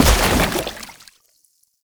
Chem Strike Step Water, Footsteps; Enemies; Foley; Warframe; Lasrian Soldiers; Lasrian Chem Strike Nox

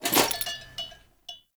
Knight Melee Swing Foley; Enemy; Mendicant; Mnd Knight

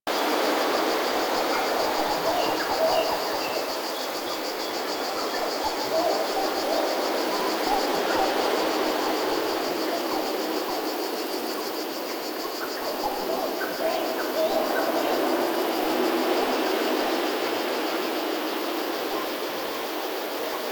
Apartment Ambience Loop; Warframe; The New War; Zariman

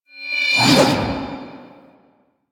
Sword Melee Heavy Charge; Enemies; Weapons; DE; Warframe; Teshin

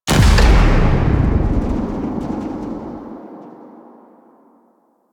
Tower Crash End; Warframe; Teralyst; Ropalolyst

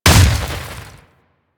Ice Proj Hit; Enemies; Abilities; Warframe; Corpus; Arachnoid Camper Terra

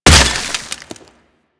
Ice Hit; Warframe

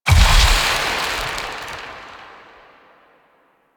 Shadow Particle Death; Warframe; Duviri

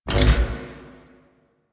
Bash Cast; Warframe; Warframes; Hoplite

